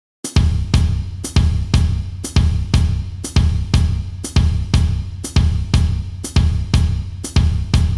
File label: Salsa 60 bpm